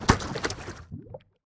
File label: strider step lava; mob; Minecraft